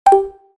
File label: Percussive off; WeMod